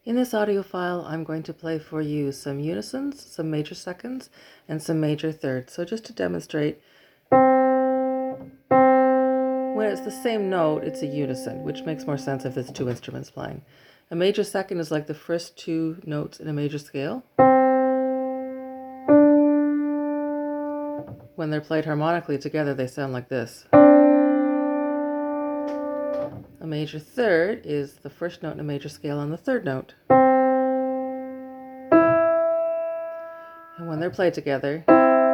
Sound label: Voice 001: Unisons, Major Seconds and Major Thirds; Interval Identification